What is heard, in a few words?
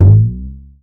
boing